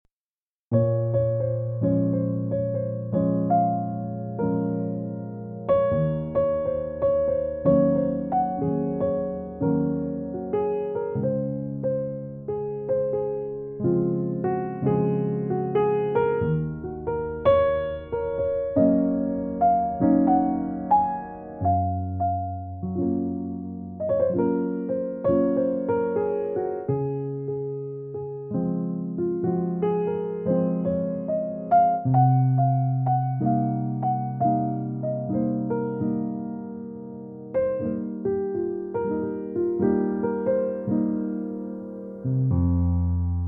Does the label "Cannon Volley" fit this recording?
No